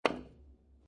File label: Billiard Pool Snooker Hit Billiard Ball With Billiard Cue; Pool Sound Effects; Lynne Music